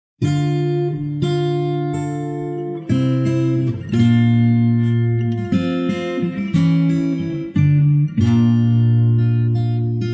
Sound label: Cool Guitar